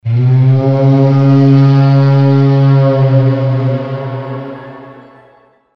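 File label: battle bugle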